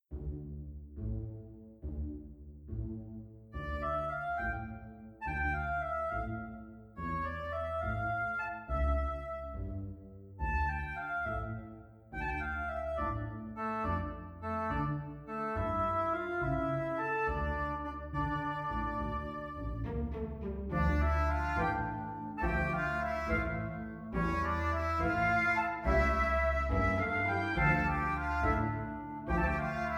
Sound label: orchestra; Il gatto